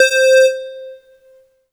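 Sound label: Yamaha TG100 Square Lead C5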